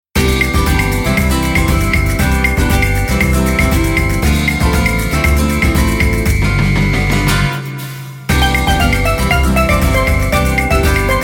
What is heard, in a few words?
bgm play; BingoGrassland